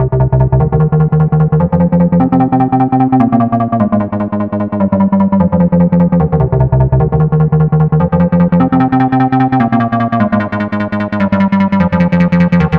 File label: Groover, acid trance techno; acid trance techno vol1